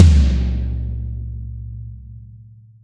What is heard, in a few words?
TOMS, Drums; Best Service; Real Mega Drums VOL-1